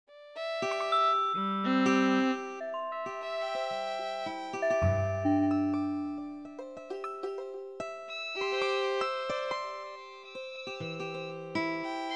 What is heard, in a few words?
just intonation noodling